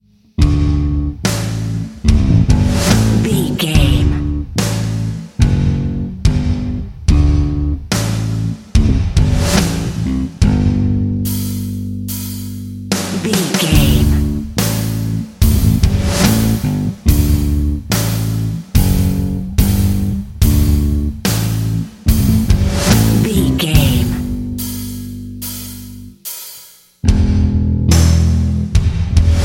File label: diesel driver bass and drums